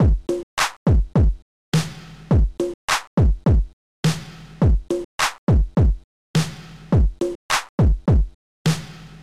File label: slow beat, 104 bpm; octabeats